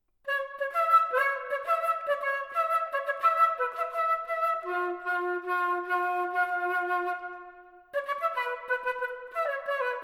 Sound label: Padding Fanfare